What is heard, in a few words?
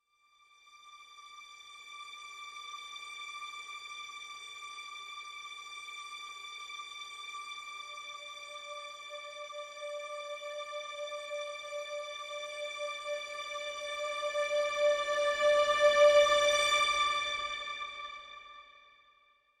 Simple String (D)